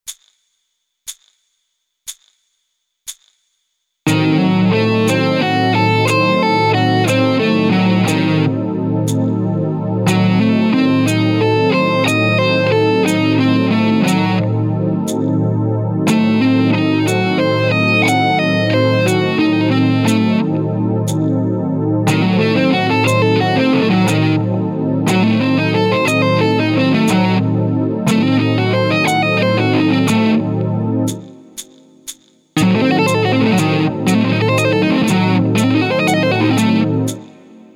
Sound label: Sweeping